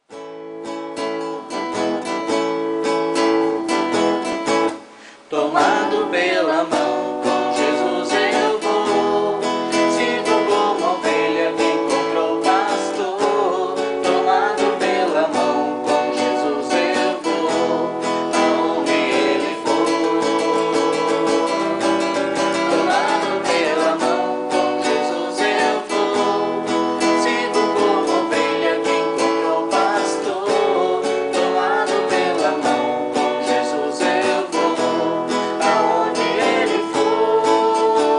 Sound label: vocal